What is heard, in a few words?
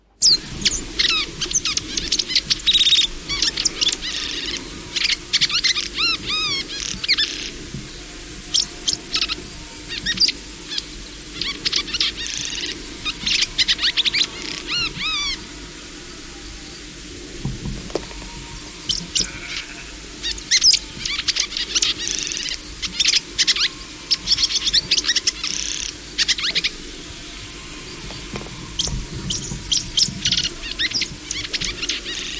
Welcome Swallow